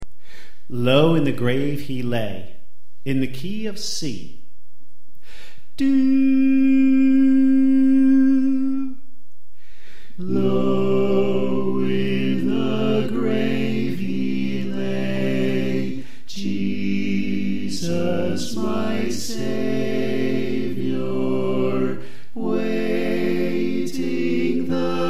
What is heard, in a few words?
Bass Left